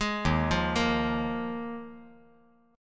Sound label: Eb Suspended 2nd Suspended 4th; Ebsus2sus4 arpeggiated; JGuitar